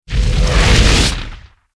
blazing effect; abilities

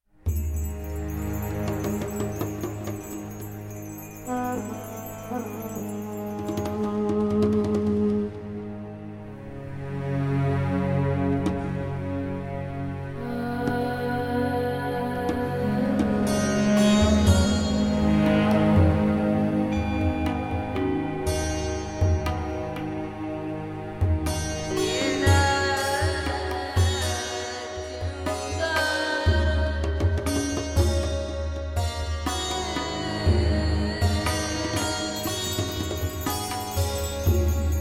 desert ambient

